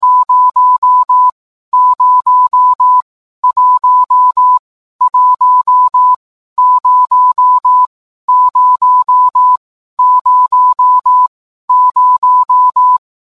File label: morse